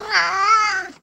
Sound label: Cat Growl Meow